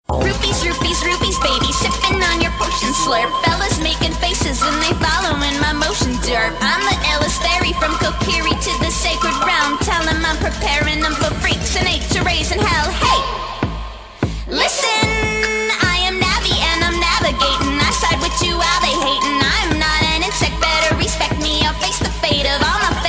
rap; legend of zelda

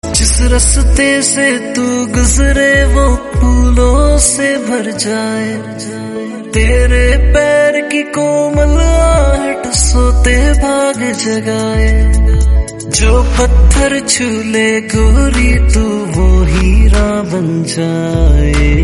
melody ringtone

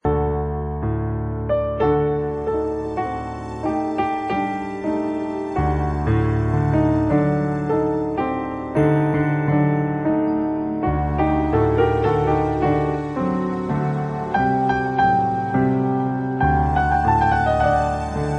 romantic

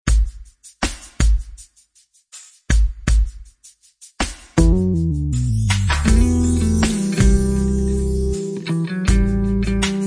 romantic

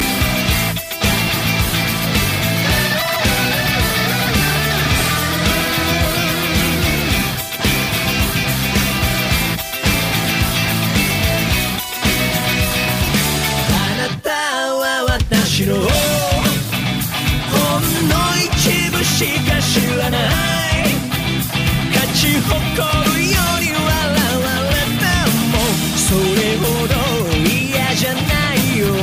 buzzer beat opening

